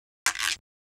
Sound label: Guiro, 098, Cmin, Drum Tracks; Hip Hop Exotica; Big Fish Audio